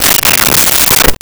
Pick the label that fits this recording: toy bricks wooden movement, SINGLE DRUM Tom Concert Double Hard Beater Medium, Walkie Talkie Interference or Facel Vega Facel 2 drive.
Walkie Talkie Interference